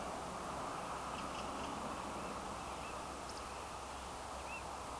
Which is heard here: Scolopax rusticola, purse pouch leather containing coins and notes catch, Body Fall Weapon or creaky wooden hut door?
Scolopax rusticola